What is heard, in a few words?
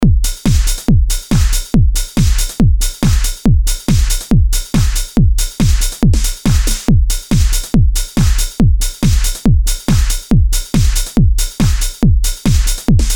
DRUM LOOP DANCE 140BPM